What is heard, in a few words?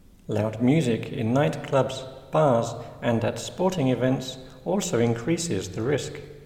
loud music